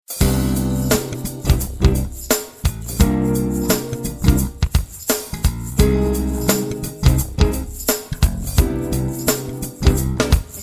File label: mellow mood